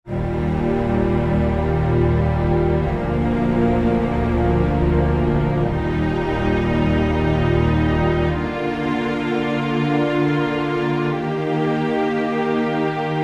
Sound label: Underscore PAD; Worship Flow